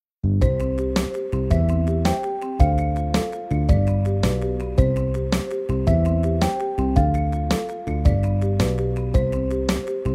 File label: instrumental; oral language kit